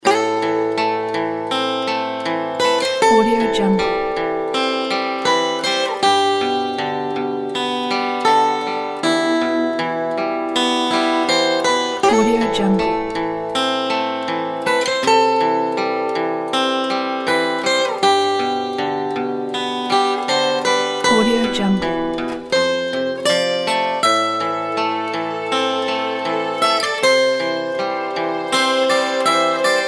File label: sad acoustic guitar with strings and choir